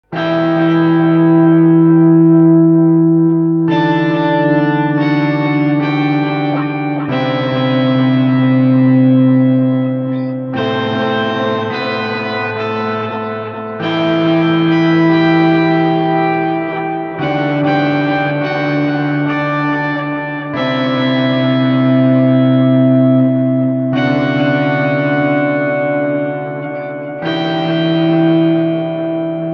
Pitch nach Overdrive und Delay

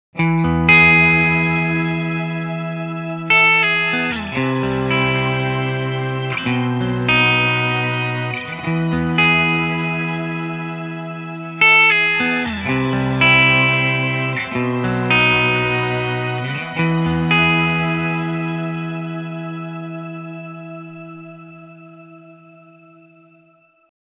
Boss ME 90 Direkt Twin Shimmer

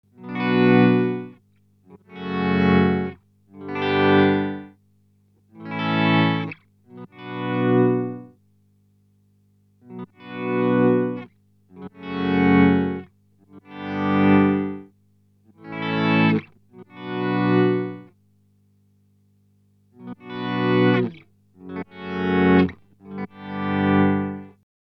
EHX Pico Attack Decay Mono Mode unterschiedliche Sens Settings

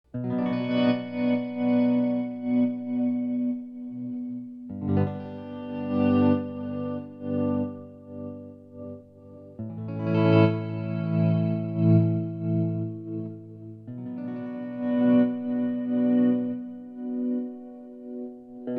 Ghost Pedal Tremolo Random und Normal; Endorphines

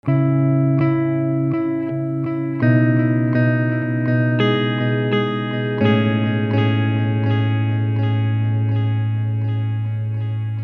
Golden Master Gitarre m side Settings; Endorphines

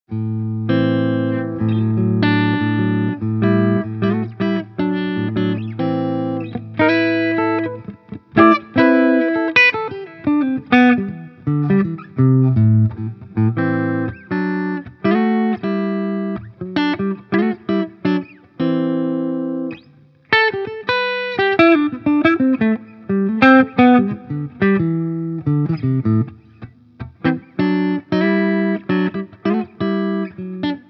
Harley Benton DC 580 Clean Halspickup

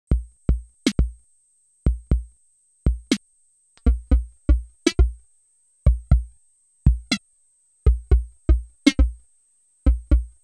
Drums mit Effekten Flanger

